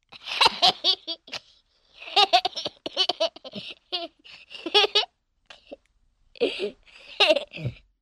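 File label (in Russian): заразительный смех маленького ребенка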